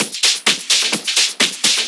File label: Discohouse Loop 128 BPM